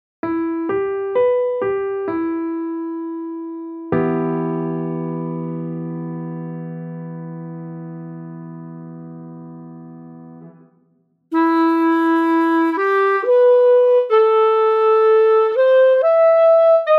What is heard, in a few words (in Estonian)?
Klarnet; e-moll